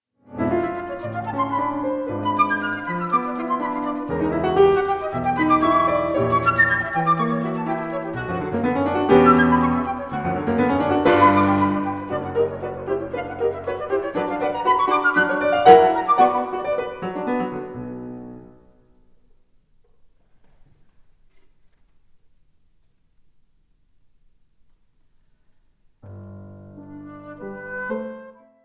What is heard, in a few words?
sonata for flute and piano in e minor; Carl Reinecke; op 167; Undine